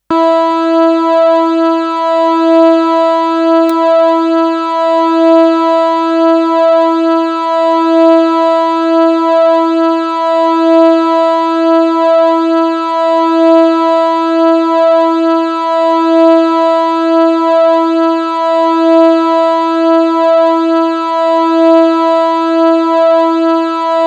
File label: First String E; synthesised notes, each about a minute long, for you to check your guitar tuning; the high E; the 1st string is the thinnest string, the one nearest the floor